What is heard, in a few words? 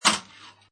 Pinball Sound Metal Clank With Slide; Video Game Sound Effects